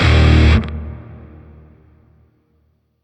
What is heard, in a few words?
Shawzin Pent Min Chord B; Music; Warframe; Grineer; Chords